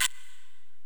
Korg x5, Drums

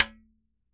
Drums Percussion Congas

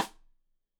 Side Stick Stereo; Deeply Sampled Drums

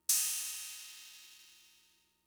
Cymbal, Fricke MFB-522, Drum Machines

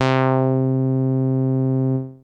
Bass Synths hits and octaves

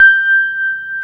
PIA DX E-PIANOS

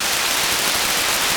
RAIN; East West - Global Sounds

